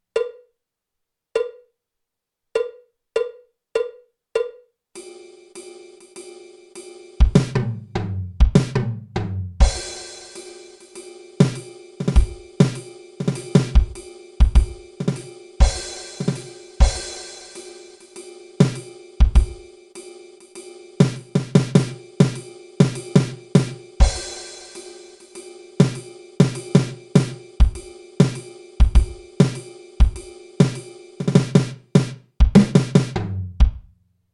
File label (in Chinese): 架子鼓独奏 爵士风格; 66条架子鼓独奏SOLO